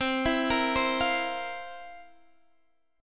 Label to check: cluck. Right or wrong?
Wrong